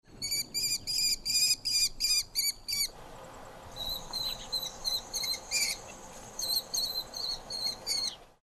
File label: Kestrel Call; Raptors